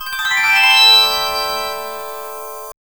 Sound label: ascending sparkle melody